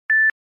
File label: samsung s3 single beep sms sound